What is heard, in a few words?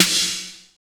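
snare drum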